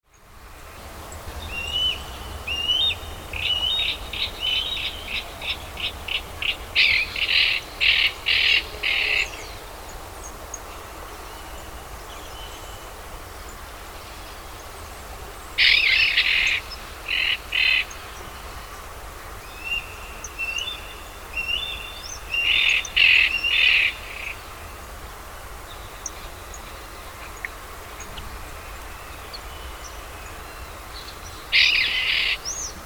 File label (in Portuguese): gavião asa de telha